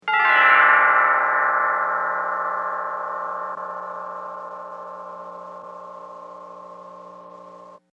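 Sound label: Glissando Bell Creepy Descending; Musical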